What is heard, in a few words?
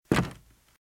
Footsteps - Wood - Creaky - Walk - Right